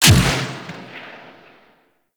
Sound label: Crew Ship Turret Shot 2D; Warframe; RailJack